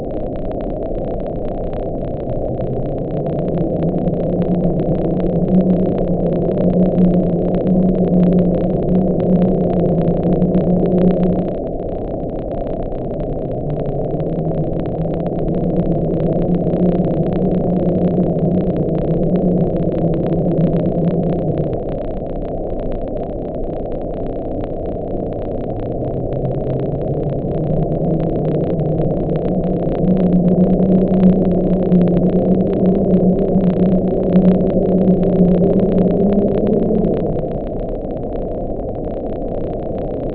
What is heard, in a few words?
tremor, vents acoustics